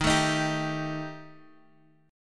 D sharp Major Flat 5th D sharp Guitar Standard, chord; D#Mb5 strummed; 11,12,13,12,x,x; JGuitar